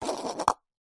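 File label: sport golf ball knock into hole cup spin to stop; zapsplat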